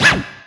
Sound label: Yamaha EX-51